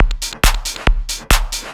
drum 138; Techno; drum-loops

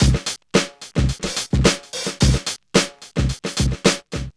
KICKIN 109, Funky Elements loops; Zero-G

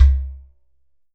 GHATAM

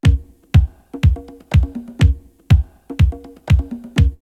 drumloops, OldSchool; X-Static Goldmine; 90 s Sample CDs

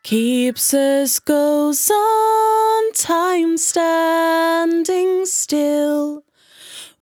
100bpm Bm, Velvet Vocal; Zero-G

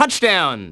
Applause; Football Fury

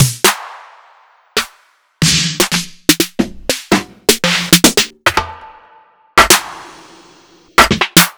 808 trap Snare Drums

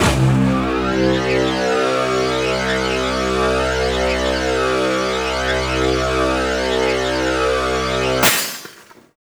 BEAM BULB, Synthy Tonal; Distored Reality

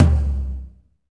tom, lite jazz, Roland

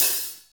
hi-hat; Hi-Hat menus

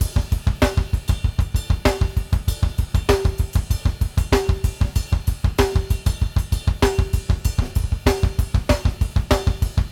+ CYM, Drumworks; Sampleheads; New York City Drumworks VOL-1

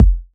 Kick, Kickdrum; Equipped Music; Loungin House

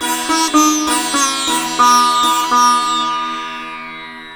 SITAR, Indian Traditions

